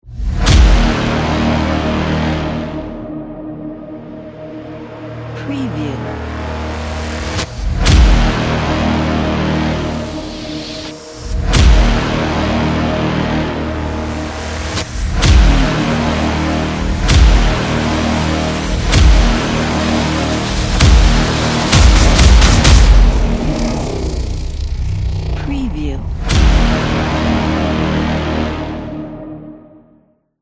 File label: epic trailer